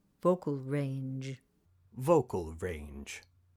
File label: Vocal Range